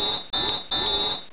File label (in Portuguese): apito; cstrike